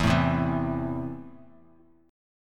E Suspended 4th Sharp 5th; strummed; JGuitar

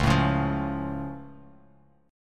Db Augmented, down; strummed; JGuitar

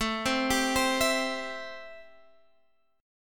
A-Minor 7th A Guitar Standard; x,12,10,12,13,12; Am7 arpeggiated; JGuitar

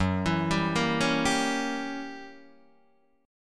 Fsharp 7th Flat 9th down Guitar Open D

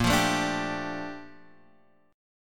A sharp Major 13th, chord; strummed; chordshape; 6,8,7,7,8,x

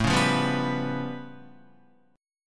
A Augmented 9th A, Guitar; strummed; 4,4,5,4,7,7; JGuitar

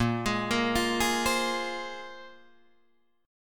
A sharp 7th Suspended 2nd down Guitar DADGAD

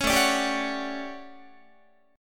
A 13th A down Guitar; A13 strummed

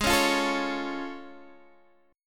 A Augmented Major 7th G sharp

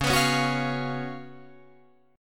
Db 11th Db, Guitar, Drop D

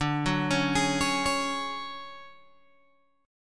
D Major 7th Flat 5th, D, down, Guitar Open G

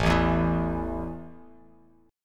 A 5th A down Bass Standard; strummed

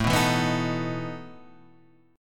E Major 7th Suspended 4th, A

